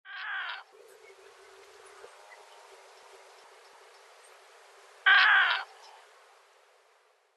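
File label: kurosagi, Pacific Reef Heron; fukuoka; koe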